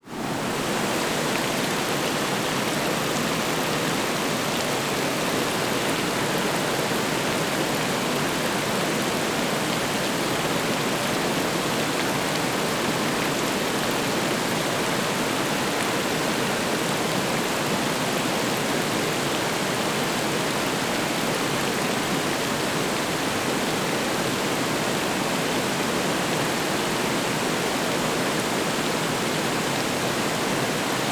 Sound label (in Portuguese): Barragem de usina de cana muito perto; Cerrado